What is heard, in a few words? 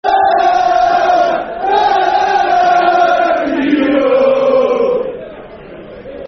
Na Na Na Na Na Na Na Keogh, Football Chants (Soccer Song); Wolves; wolverhampton wanderers; fanchants